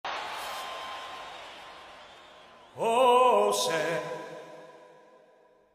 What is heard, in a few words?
ooh